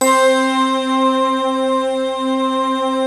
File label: D50 Stak, Roland